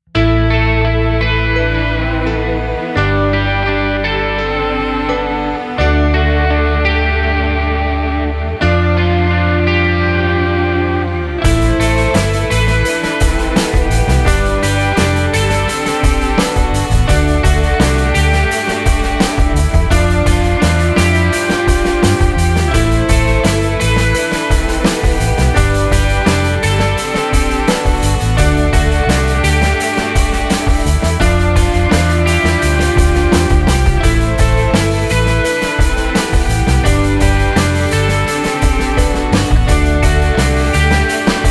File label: Pop